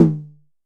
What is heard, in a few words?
Drum X Tom, Pearl Drum-X